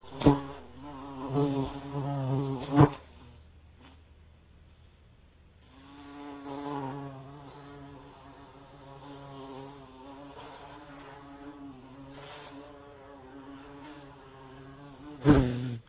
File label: buzzing bee